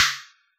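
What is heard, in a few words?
snare drum Snap Lo, Yamaha DJX; 155 DJX Kit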